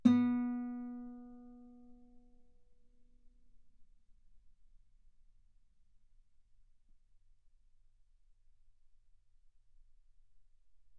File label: Guitar B3; ff; sul B